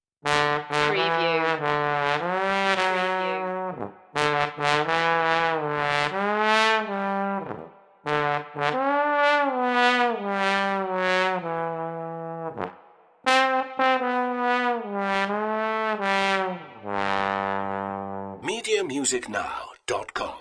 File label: happy birthday to you boozy trombone